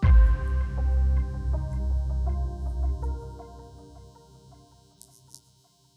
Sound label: Kick; Mystery Kick; Weird